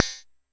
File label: Jomox MBrane, drum machines; Jomox MBrane 11